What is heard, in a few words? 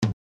Tom Drum Sound